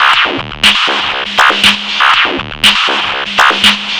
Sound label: Drums Strange